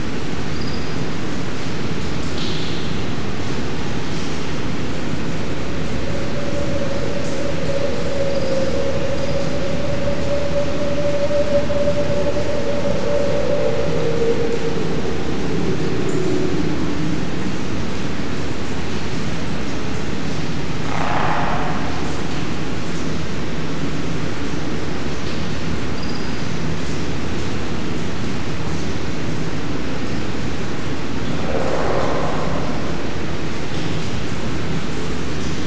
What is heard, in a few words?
cave ambience; gauntlet seven sorrows